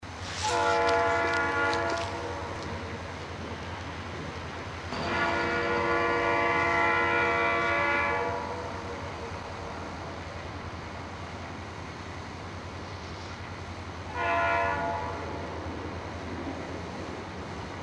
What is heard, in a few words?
Conrail SD40-2 NS C44-9W Empty Coal Train